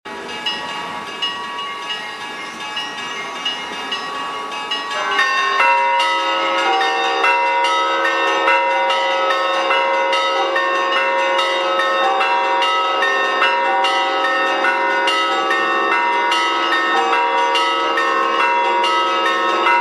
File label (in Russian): Blagoveschenskiy kafedralniy sobor goroda Harkova kolokolniy zvon